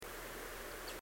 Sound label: mystery call